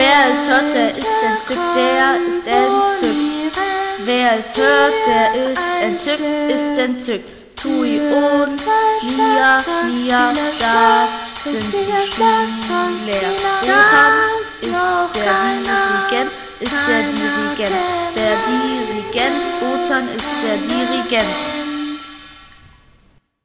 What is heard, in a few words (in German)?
Gesang; Das entzückendes Stueck